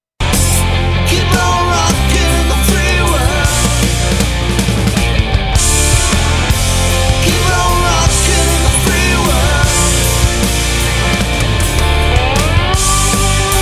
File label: Rock Band; Las Vegas